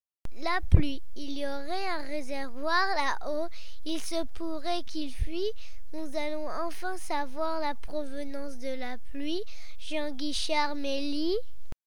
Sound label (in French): la pluie